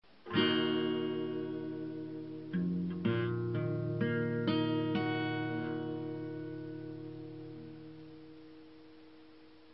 Accord D diese m

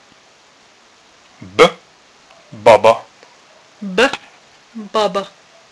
B hang

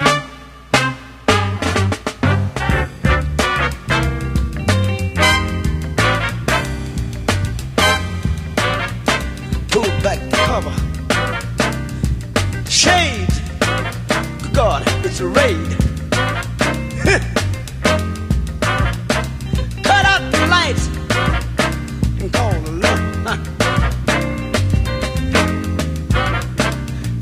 sample

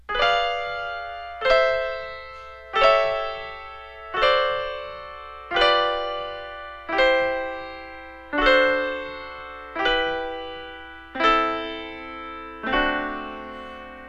Harmonic Progression, Piano Sonata; Mozart; K283; 3rd Mvt

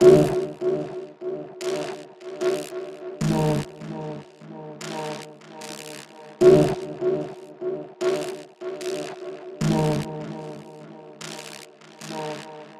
Percussive dark voice noise Tension Loop 75 bpm; MatiasMacSD; Affright

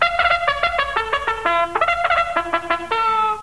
Trombo

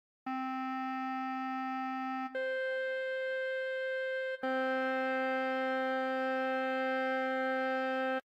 Octave ET

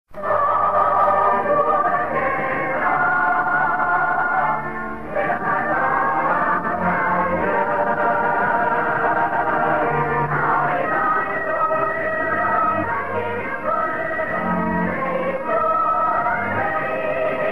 Vocal short

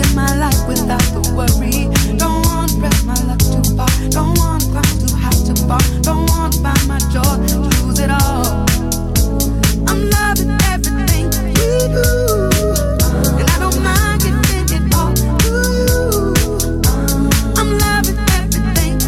House Music Samples

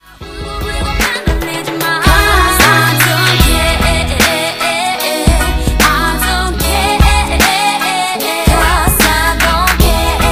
Reggae

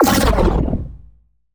Weapon Bomb Explosion Sci-Fi Plasma; MatiasMacSD; THE WEAPONS - SCI-FI WEAPONS

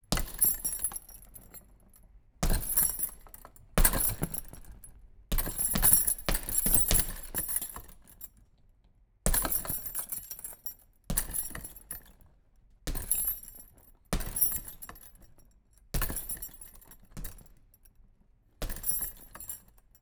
Gym, Boxing, Punching bag hits, chain perspective; Borg Sound; Gym weights

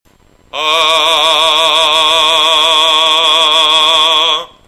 VIBRATO

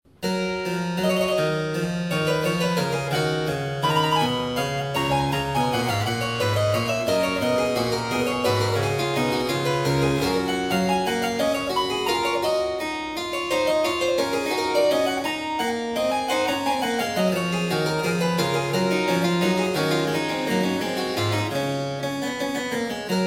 Goldberg Variations, Variatio 2 a 1 Clav; J.S.Bach; BWV 988

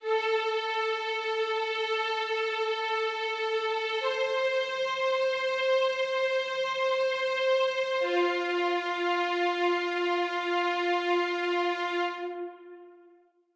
Three violin notes

